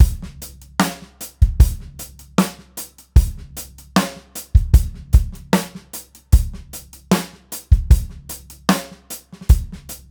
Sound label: 76 BPM; R&B/Rock #1 (76 BPM)